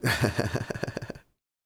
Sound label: LAUGH, Vocal Jazz Fx